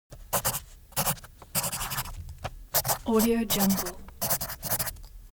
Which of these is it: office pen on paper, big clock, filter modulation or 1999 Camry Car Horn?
office pen on paper